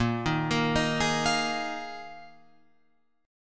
A sharp 7th Suspended 4th down Guitar Open F; arpeggiated; JGuitar

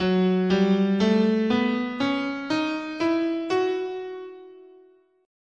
Gb Phrygian sharp6, scale

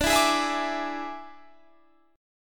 D Suspended 2nd Flat 5th D Guitar Standard; 22,19,18,21,21,22; strummed

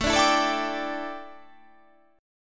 A 7th Suspended 4th, chord

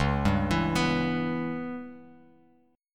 Db Minor 7th Flat 5th Db down Bass Standard; arpeggiated; JGuitar

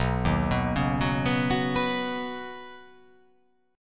B Major Add 9th B, chord; Badd9; arpeggiated; JGuitar

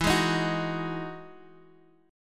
E Major 9th E, Guitar, Drop D; chord shape

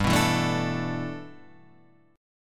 G 6th Add 9th, Guitar, Half Step Down

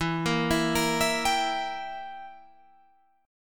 E Diminished down Guitar Open G

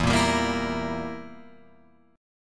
E Diminished down Guitar Open F; Edim strummed; JGuitar

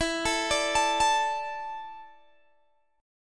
E 7th Suspended 4th, Guitar, Open G

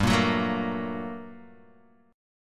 G Minor Major 9th G, Guitar, Open D; chordshape; down

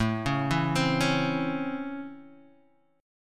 Db Minor Major 7th Sharp 5th A

